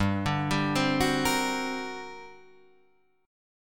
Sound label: G Minor Major 11th, G, Guitar, Drop D